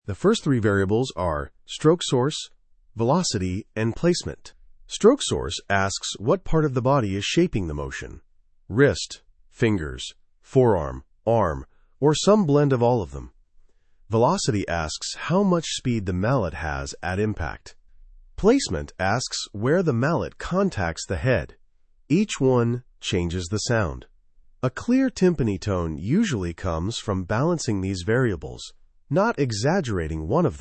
timpani tone guided companion track, timpani stroke and tone